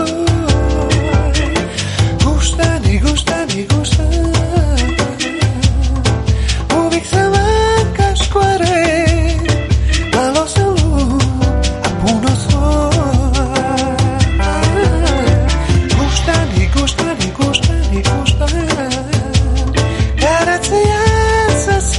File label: Piano